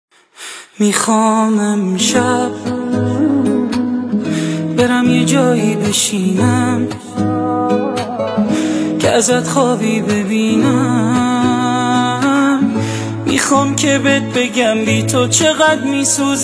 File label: gitar version